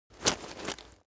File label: cloth leather metal handling short; foley; smartsoundfx